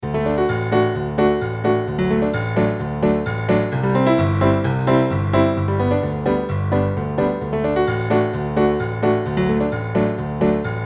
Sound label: Accomp.; Prince William All-County